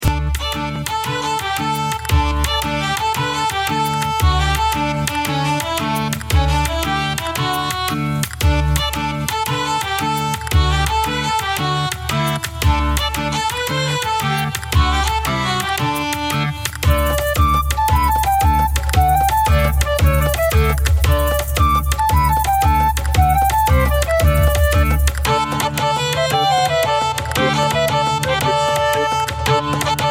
Bgm Fantasy

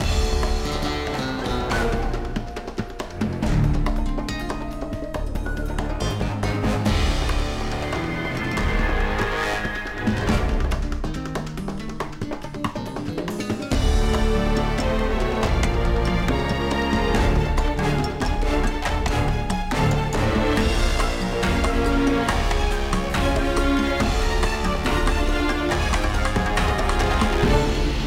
Jungle Action High

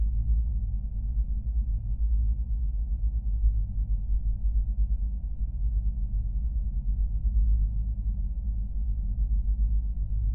Ambient Pods; Among Us

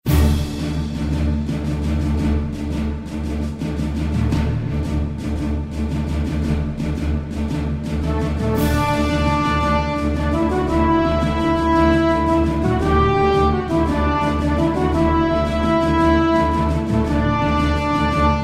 Menu Music; Patrician III Empire of the Seas

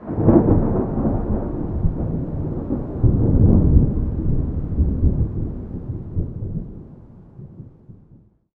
thunder lightning strike rumble

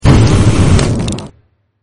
Fire; Tempala

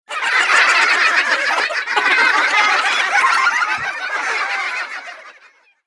Menu Tally Failed

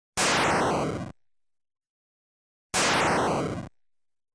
Lightning Sound; Kaijuu Densetsu The Tale of the Shell Monsters; Opening Demo Screen Lightning Sound

